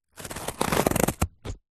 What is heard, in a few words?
cardboard score cut slice with knife